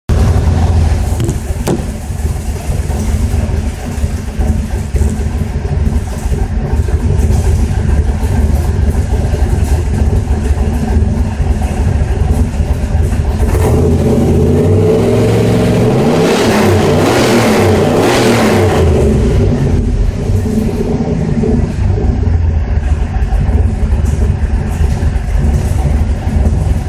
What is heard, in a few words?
muffler sound, trans am dynomax; 1995; lt1 lt4 modifications; muffler shopping looking sound clips